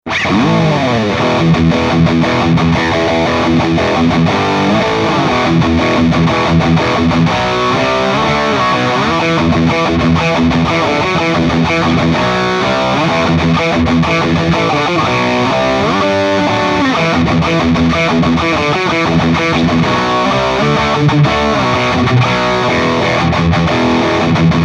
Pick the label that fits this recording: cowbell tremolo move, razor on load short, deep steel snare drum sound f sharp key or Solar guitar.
Solar guitar